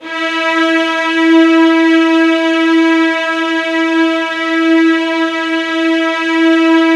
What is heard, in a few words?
VIOLINS F#, Strings; ILIO; Synclavier Strings